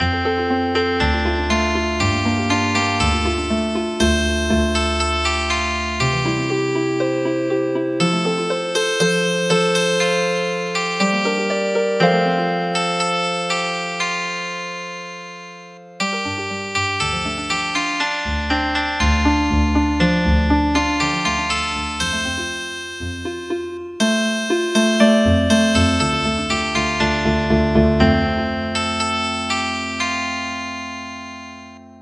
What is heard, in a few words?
Healin Harp